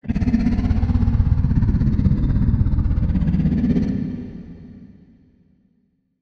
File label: ENDWORM idle; Barotrauma; Legacyendworm